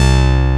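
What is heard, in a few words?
analog electric piano C2, analog synth; AKAI S6000 CD-ROM - Volume 1; SAMPLE WAVE MIXING 1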